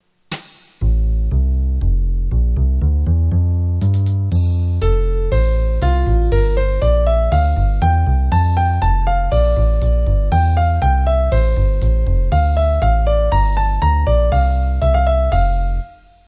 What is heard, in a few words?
opening pf trio